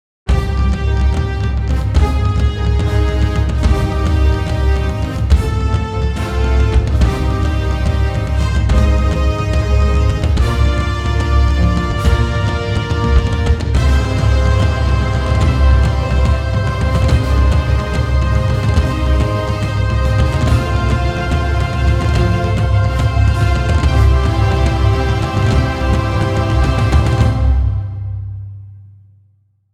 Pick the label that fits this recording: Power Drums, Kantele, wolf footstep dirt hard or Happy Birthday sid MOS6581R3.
Power Drums